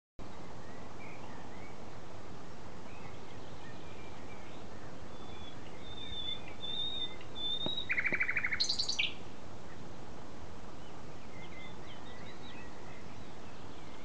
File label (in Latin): Luscinia megarhynchos; SETE